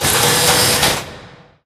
motel wall collapse; Source Engine; Portal 2

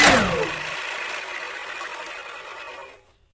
minigun wind down; tf

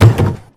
puck impact; Left 4 Dead 2; Source Engine

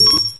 roller remote yes; Source Engine; video game; npc

